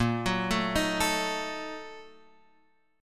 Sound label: Bb-Major 7th Suspended 4th Sharp 5th, Guitar, Open F; arpeggiated; JGuitar